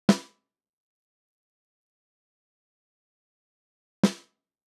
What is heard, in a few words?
Sn dry